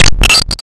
science fiction radio interference burst

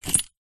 car keys pick up from car seat

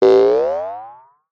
cartoon boing ascend jaw harp; zapsplat

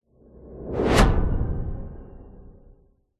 cinematic whoosh fast into drone dark impact; sound design

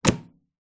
household air fryer oven door close hard

